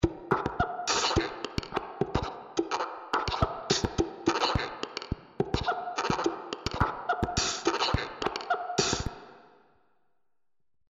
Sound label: Sing